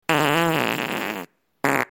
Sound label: FARTT; memes